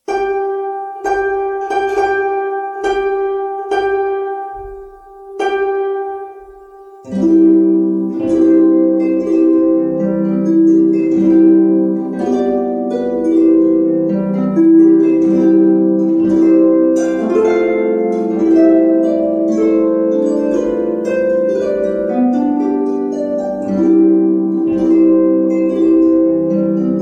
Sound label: Bridal Chorus